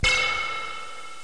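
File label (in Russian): синтезатор одиночный эхо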